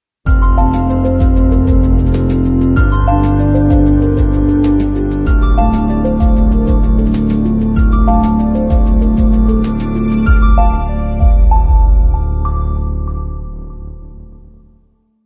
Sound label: Ambient Logo No FX Full